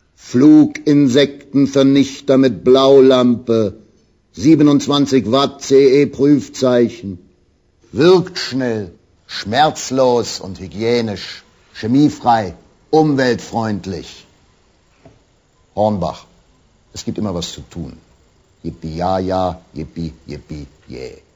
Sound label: yippee ya ya yippee yay